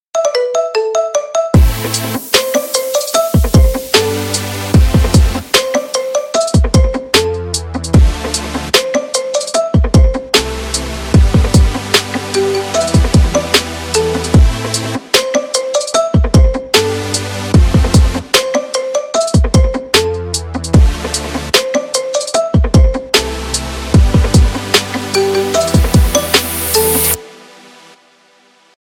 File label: Fanatasy Marimba